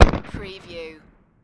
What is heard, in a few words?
Firework Close